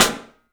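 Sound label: PICOLO SNRS, snare, drums; 3D Sound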